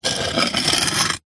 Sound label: foley brick slide on other brick short movement